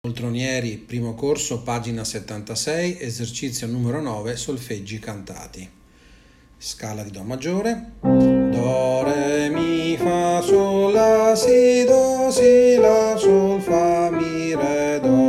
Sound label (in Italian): solfeggio cantato; Poltronieri 1c p. 76 es. n. 9